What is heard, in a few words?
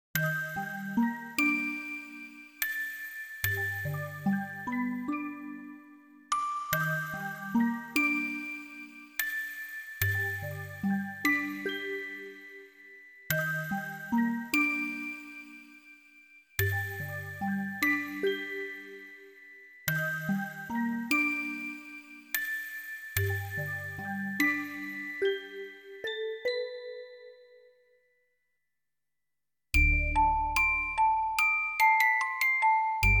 Orgel ver.; Symphonic Rain